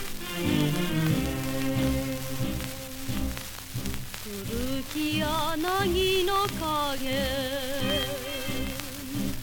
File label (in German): rauschen